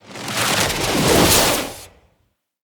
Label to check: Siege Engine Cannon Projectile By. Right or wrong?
Right